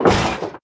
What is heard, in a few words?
flip, car